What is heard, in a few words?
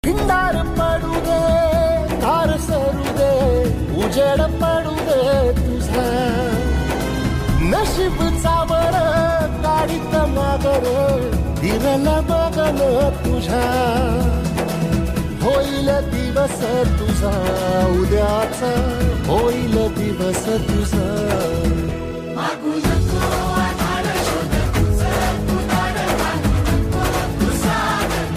Marathi Ringtone